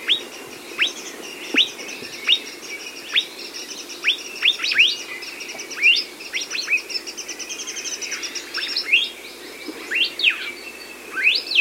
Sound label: Elepaio